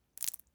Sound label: water or blood dribble; prosoundfx